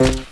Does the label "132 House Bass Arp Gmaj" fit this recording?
No